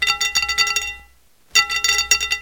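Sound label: clanking of metal object on plate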